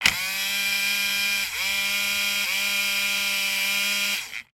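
toy remote control car turn wheels tilt motor whir still upside down; zapsplat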